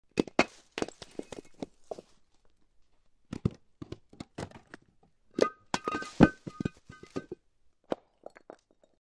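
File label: Ceramic Rock Movement, rocks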